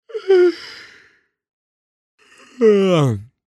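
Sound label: guy yawns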